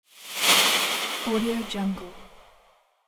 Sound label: long swoosh